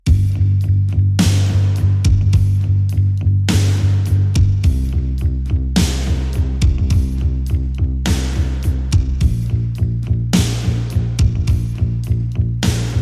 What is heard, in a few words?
Drums and Bass Mix